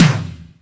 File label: EMU SP 12, drum machines; Kult